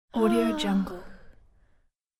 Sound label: female romantic satisfied; آه کشیدن عاشقانه زن; human sounds